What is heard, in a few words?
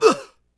Hit Vox; Civ4